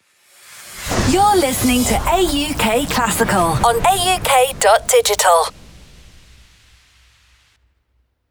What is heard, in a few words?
classical